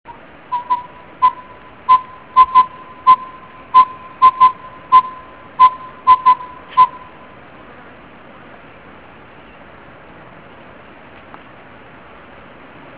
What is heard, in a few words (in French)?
chevechette a collier glaucidium brodiei cri nocturne